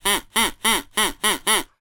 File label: cartoon duck quacks whistle